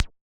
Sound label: Yamaha DJX 2B, C Pulse